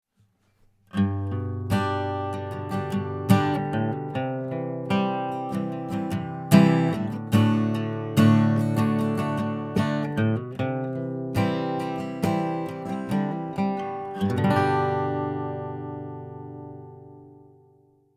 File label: Basic Capo 2